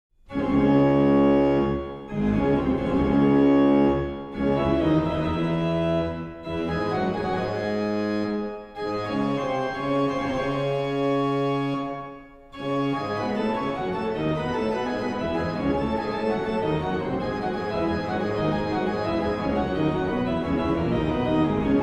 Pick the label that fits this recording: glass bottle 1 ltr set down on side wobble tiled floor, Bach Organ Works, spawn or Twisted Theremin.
Bach Organ Works